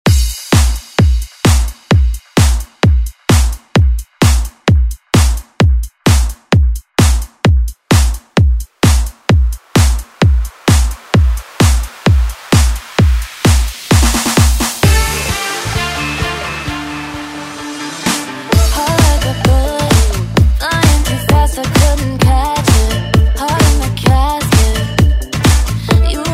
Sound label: Pop Redrum; Deejay Hit